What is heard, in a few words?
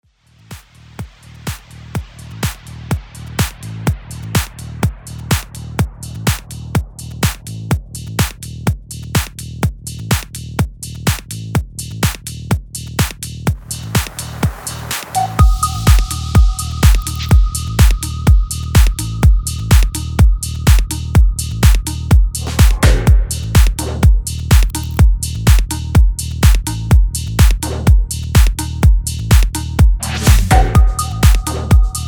Acid drops